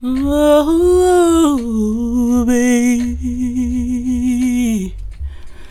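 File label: FEMALE VOX, Hip Hop; E-MU Formula 4000 Series Vol. 1; Hip Hop Nation; Phatt Phemmes 4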